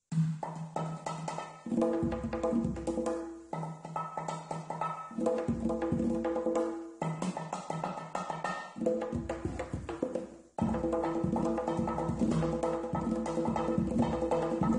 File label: Kassoora, ساز